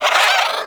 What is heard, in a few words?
Bird Tranquillized; Hunting Critters; Sawgaw